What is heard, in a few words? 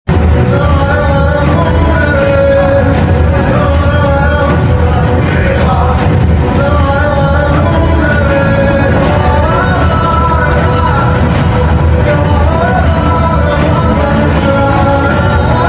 CONTAINER BGM Movie Bgm BGM Ringtone; devara; ntr